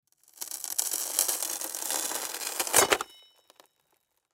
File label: Axe Pull From Broken Windshield Crackle; Fire Department; Emergency